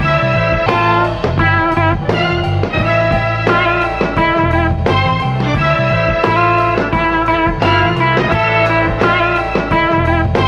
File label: guitar, Phonky Loops